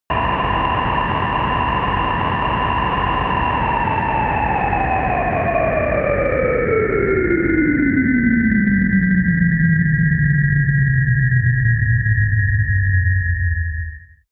eerie wind scream fall, drones; musikelemente

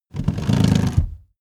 car seat slide forward fast rattle

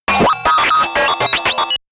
calculator high, comedy cartoon robot; soundpool

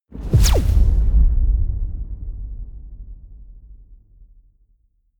sci-fi plasma gun fire sweep; pistolen gewehre

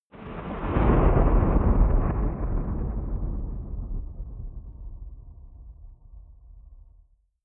digital thunder

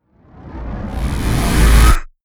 whoosh stutter; swooshes-swishes

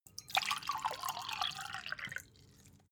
glass pour ring; soundpool

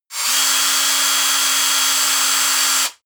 cordless drill high rpm; maschinen; bohrer; soundpool

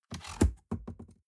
cardboard box open unfold bounce scrape; objekte

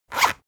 zipper open harsh; objekte; reissverschluss

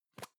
plastic can lid screw open soft; objekte; dose

